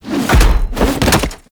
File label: Whoosh Landing Jump; Orb Vent Finisher; Warframe; Venus; Orb Heist; Cinematics